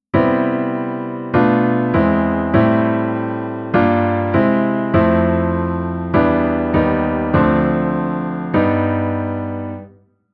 Chord Reharmo

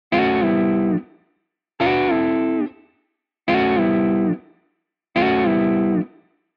guitar pattern 2 gt

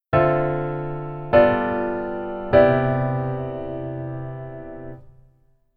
keyboard voicing form

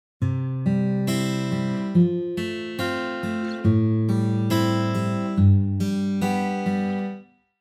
guitar arpeggio midi

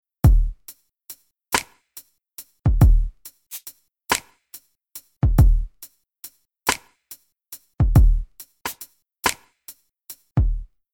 Rhythm RnB

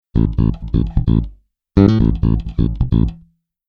Bass

